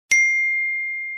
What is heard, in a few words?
i phone